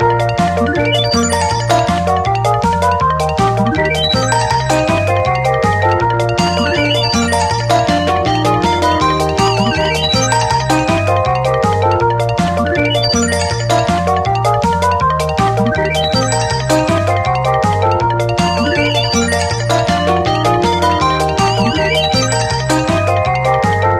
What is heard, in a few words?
Salsaparty; 8-bit Fiesta